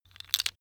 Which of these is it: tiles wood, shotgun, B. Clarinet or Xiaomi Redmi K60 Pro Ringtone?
tiles wood